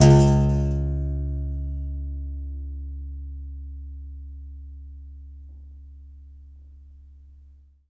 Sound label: C. Bass Balalaika, Plucked Strings